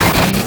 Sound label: Static; Blastwave